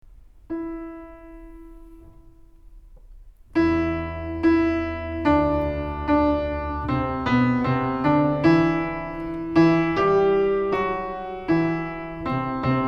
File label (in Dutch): heilig bas